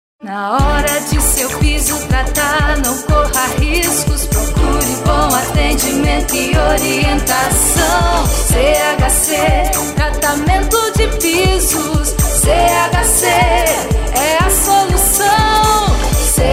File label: jingle; CHCpisos; comerciais